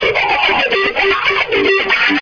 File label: wakk, guitars